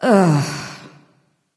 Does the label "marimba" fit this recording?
No